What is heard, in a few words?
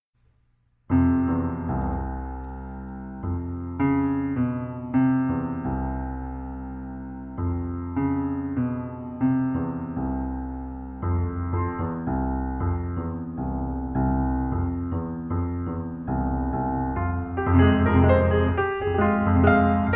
Mazurka op.7 no.3 in f minor